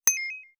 Elec crystal

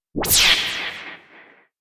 Laser whiplash

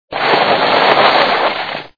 snow ski

